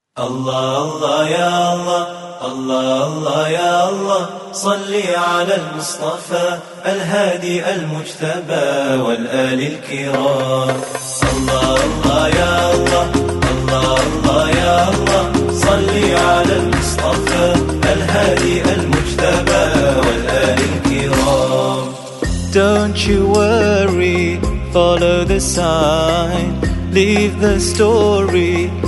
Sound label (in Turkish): allah allah ya allah